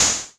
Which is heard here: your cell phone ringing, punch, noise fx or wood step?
noise fx